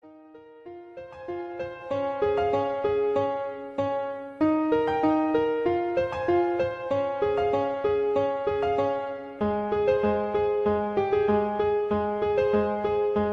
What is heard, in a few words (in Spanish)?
himno nacional